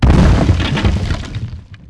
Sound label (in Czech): dopad zebrik; Mafie 1